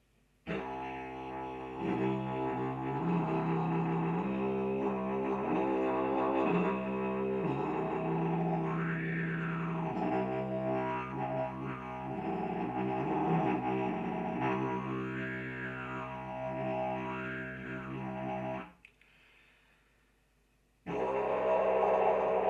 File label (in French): vocalise, Les vocalises, didgeridoo; Faites le bourdon de base et chantez dans votre didgeridoo.